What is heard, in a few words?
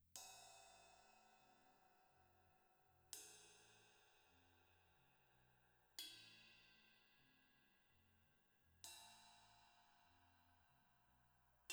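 Overhead stereo Drums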